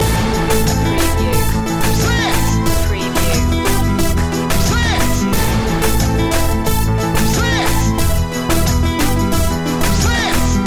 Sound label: splanglish reaggaeton loop